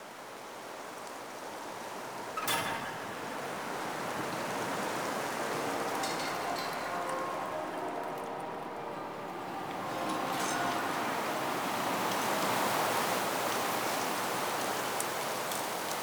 Winter Exterior Large Bed Loop, Ambience; Warframe; Nineteen Ninety Nine

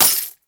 Fist Claw Coin Hit; Weapons; Warframe; Tenno; Incarnon

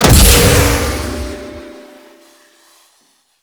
Shot; Warframe; Grineer; Ogris

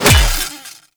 Melee Hammer Impact Robotic Flesh; Warframe; Hammers

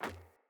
Sandals Run Metal, Footsteps

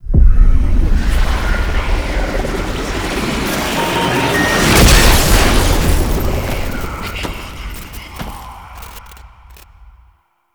Stinger; gameplay; Warframe; Entrati Labs

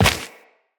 Run Dirt, Footsteps; Tenno

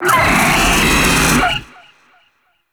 Vocal Pain; Warframe; Teralyst; Ropalolyst

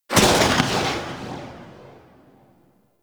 Fire 2D Tail, Rifles; Snipetron

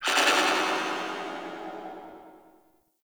Distant Metal Ronks; Ambience; Warframe; Corpus Railjack

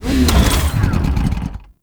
Motorcycle, Dismount Moving; Warframe; Vehicles; Motorcycle Ninety Nine

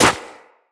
Run Snow, footsteps; Operator

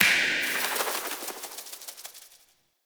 UI Summon Sparrow Finger Snap

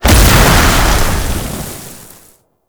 Wrist Gun Alt Explosion; Weapons; Warframe; Tenno

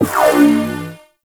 Shot Layer, pistol; Warframe; Corpus; Blastor Pistol; BE Plinx

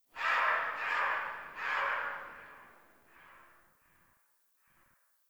Tree Distant Critters Scared; Ambience; Warframe; Duviri

